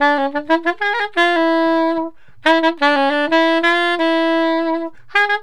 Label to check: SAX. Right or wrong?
Right